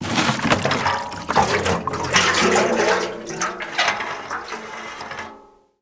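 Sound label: draining remaining water from an iron barrel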